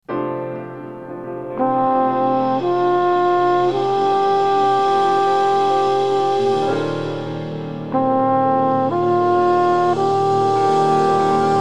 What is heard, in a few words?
minus bass